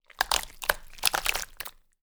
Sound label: Borax, Impact, Slime, Gore; Mattia Cellotto; The Borax Experiment